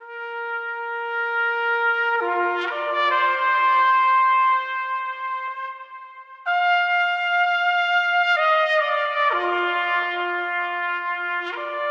Trumpet; Escape Team (DLC); The Escapists gamerip 2015

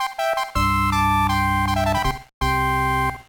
Jingle; Nakayoshi Pet Advance Series 4 Kawaii Koinu Mini Wanko to Asobou Kogata Ken; GBA

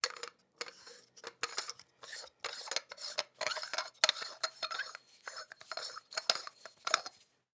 metal cap screw on metal

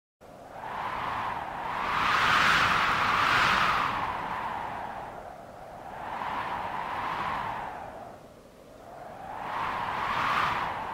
SFX Amb Ext Wind; Family Guy Back to the Multiverse

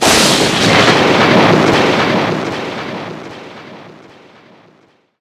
SFX - Item - Lightning; Mario Kart 8

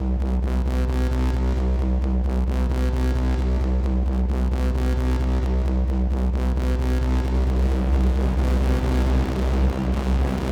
Music Drone; Battlefield 3

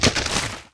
Break; The Denpa Men; Android

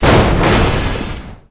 Explosions Destruction of War Machines

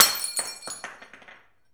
glass break

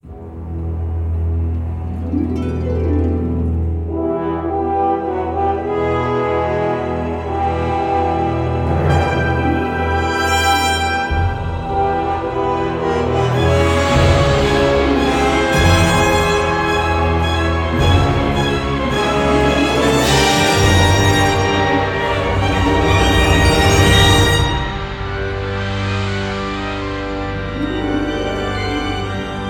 With Storm SFX; Ace Combat X2 Joint Assault; PSP; Spiridus

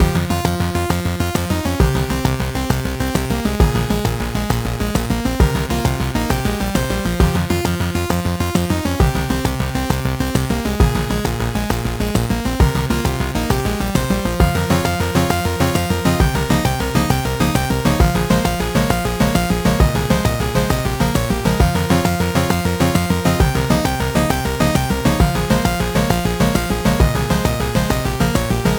ShootingGallery Game B (100bpm); Giant Consequences; Minecraft Story Mode Season 2 Original Soundtrack

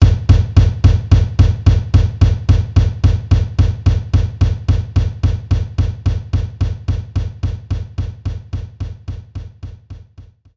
Room Kick

